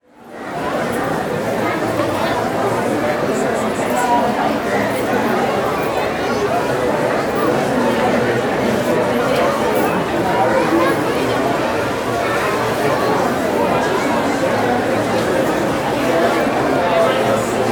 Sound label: Crowd Indoor Large Marketplace, Walla; Sound Ideas; Walla Series